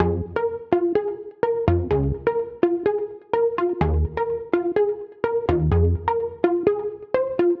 126 BASS RIFF Fm Db Eb F

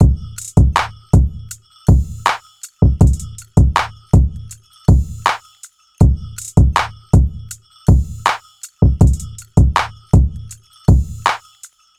drum loop; turqouise

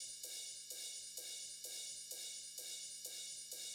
128 drum loop dated cymbal

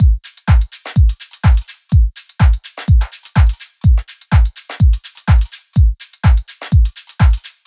125 Drum Loop Full

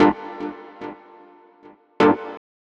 Gm7 Music Loop, 120

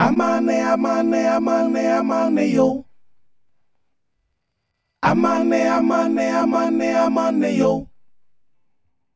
95, vocal ensemble, Amin

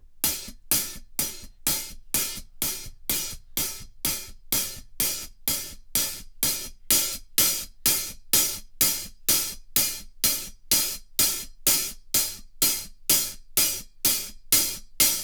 126 Hats Pulse

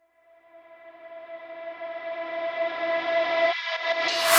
Vocal Reverse (E)

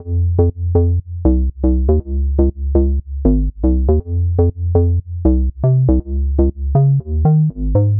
120 Synth Bass Loop WET Fm

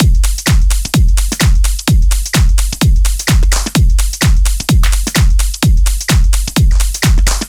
Drums Full

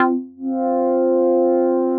C Synth Hit

